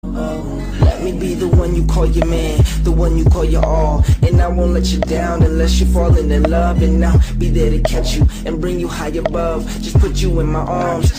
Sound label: rap version ringtone